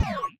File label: beam hit, weapons